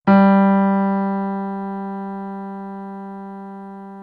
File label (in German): klavier g3